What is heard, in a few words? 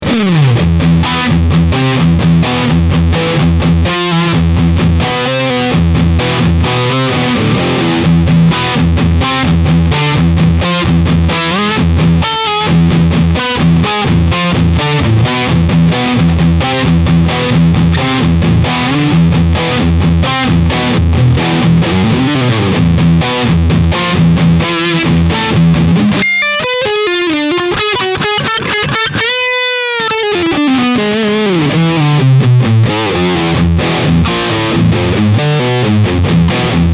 Peavey 5150